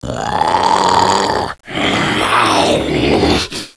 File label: hunter idle, zombie idle